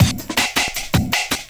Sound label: Drum & Bass, 160 BPM; Ueberschall; Drum & Bass Flash Trackz